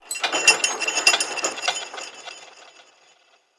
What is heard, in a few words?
Sky Fortress Chain Move Trippy Low; Ode Sky Fortress; Tunnel